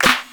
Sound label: good steel snare drum f key